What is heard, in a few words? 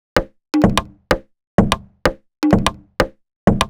127bpm, House Drumloops; 90 s Sample CDs; Zero-G House Fabrik Drumloops; ZG Drumloops